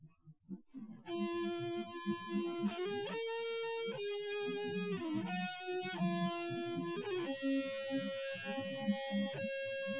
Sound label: nodrums inst src